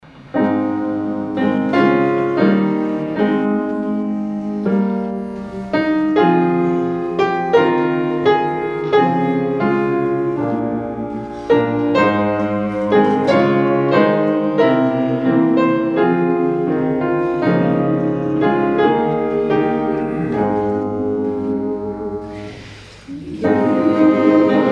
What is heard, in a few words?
Hymn; You Are All We Have